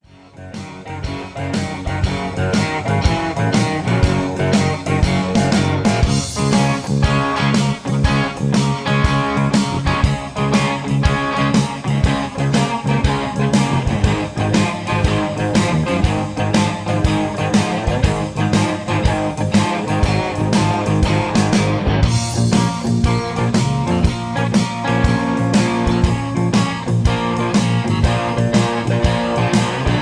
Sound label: I-V-VI-IV Texas Boogie C 120; Peach Jam Vol 1 Part 2 Texas Boogie